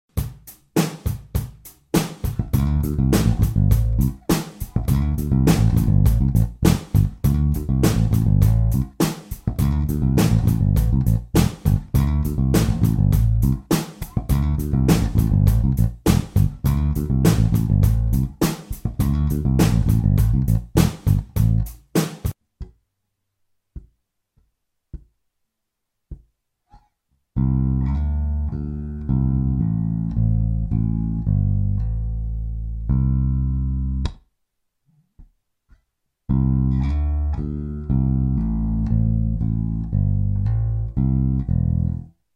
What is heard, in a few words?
Open string bass lick